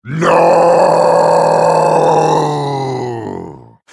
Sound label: nooooooo